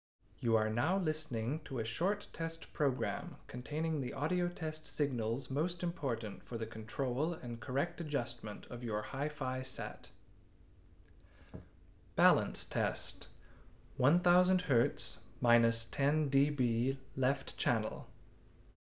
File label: Test Signal